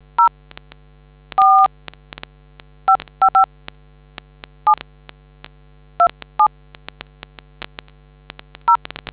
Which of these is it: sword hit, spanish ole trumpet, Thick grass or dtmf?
dtmf